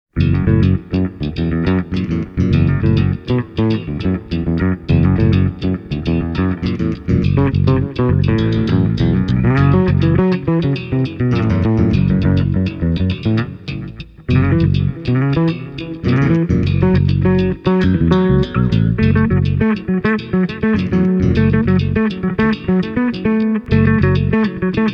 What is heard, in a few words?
bass demo song basses only